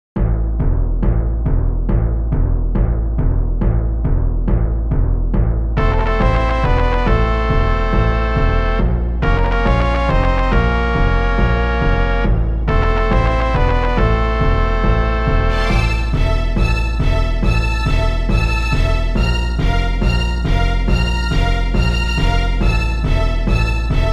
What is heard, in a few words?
music quest battle